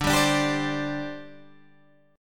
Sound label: C Suspended 2nd, D, guitar chord